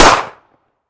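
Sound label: short ringing shot in tunnel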